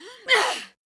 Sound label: Grunt; Lewd SFX